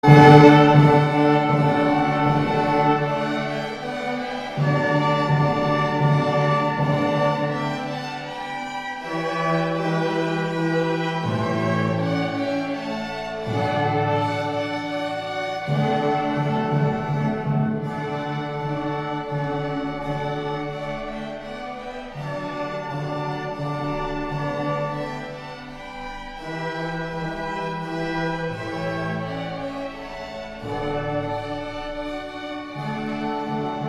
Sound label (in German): Vororchester Haendel Finale aus Wassermusik